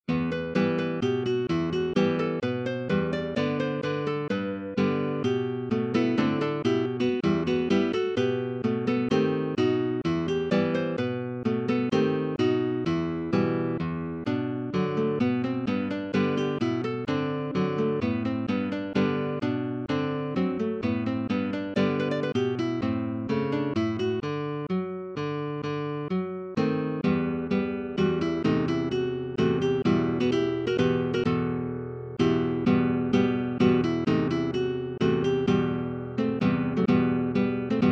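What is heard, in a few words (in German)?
mazurka e minor; notenscanner; ciurlionis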